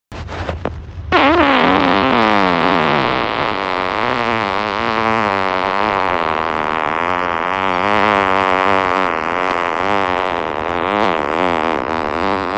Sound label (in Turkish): dunyanin en uzun osuruk sesi